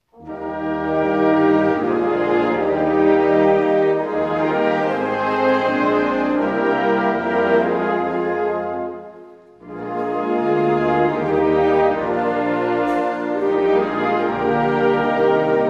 National Anthem of Austria instrumental

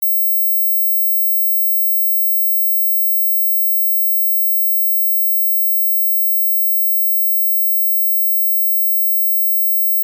17KHz

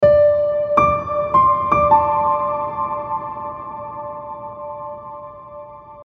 DreamGrand

